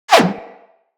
Bullet Parry Metal; Impacts; Bullet Parries

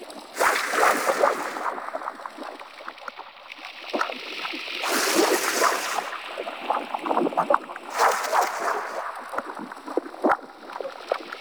Shroom Farm Loop; Ambience; Props; Loops; Warframe; Venus Open World; Venus Landscape

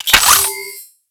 Nav Coordinate Pickup; Warframe; Pickups

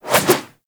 Generic Backflip Whoosh; Warframe; Characters

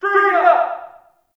Ambient Campsite Yells; Warframe; Tau

